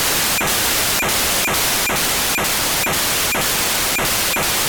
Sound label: 437 MHz 4k8 GFSK beacon, satellite; Lucky-7; 437MHz525; On October 31st 2022 at 14:38 UTC Daniel DL7NDR received on 437.525 MHz the 4800bd GFSK be